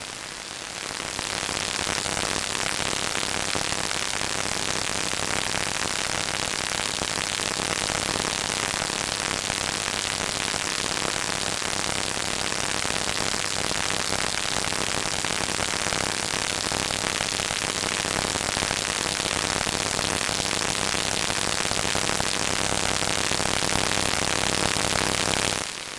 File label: glitched tones AM Radio Interference